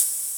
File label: Open Hat